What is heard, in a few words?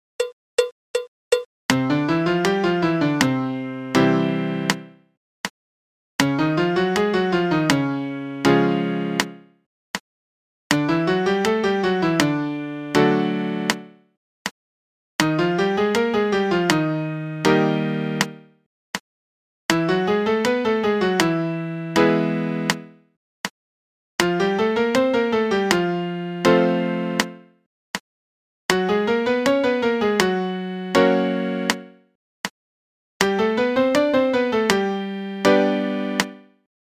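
5 Finger Triad chromatic 160, piano practice tracks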